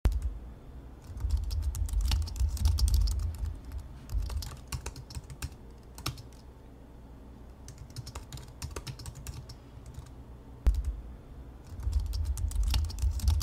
laptop typing

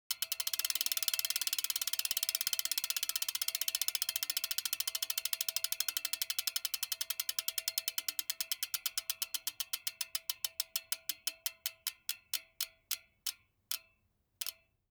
bike wheel spinning

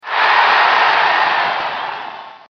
crowd

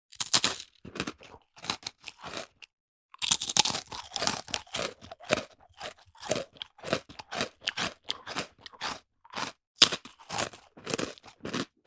Eating cracker sound effect

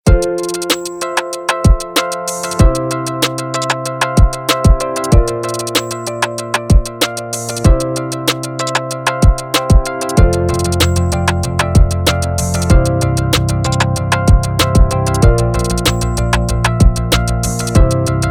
educational background music

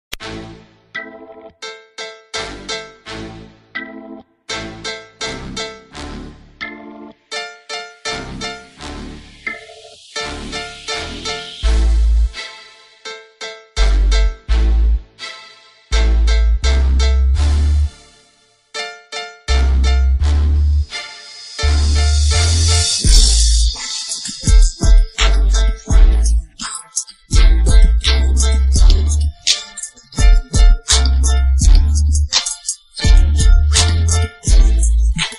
karaoke music backround music

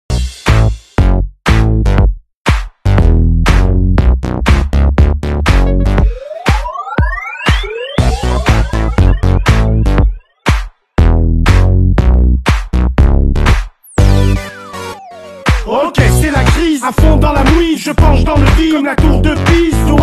bass em rap funk

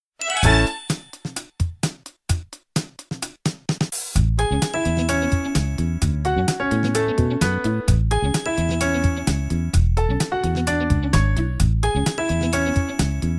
remix

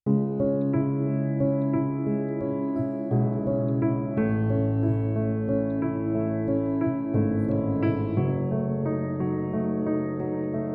dark light music

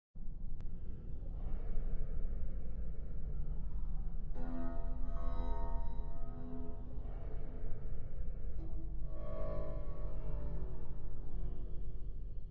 menu ambience; scp soundtrack; extended

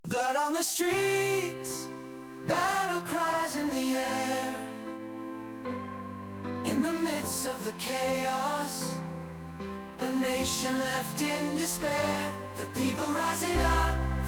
Canción Spanish Civil War

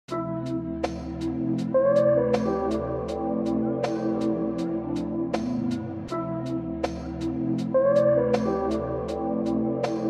Soft vibes

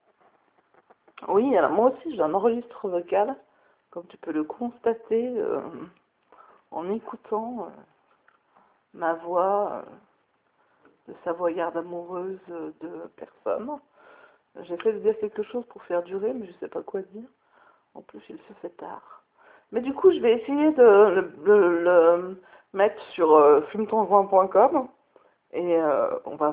Vocal

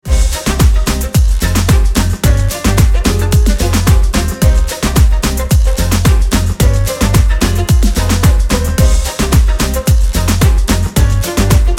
energetic background reggaeton short music; 27 sec fun vlog music

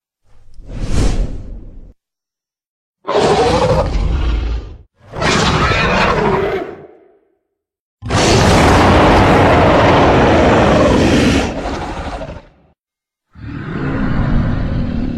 Dragon Sound Effects

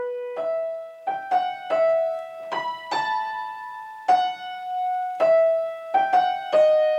piano; tuto harry potter piano facile niveau 1

